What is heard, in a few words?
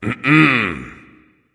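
non-verbal clear throat; smite; voicepack; houyi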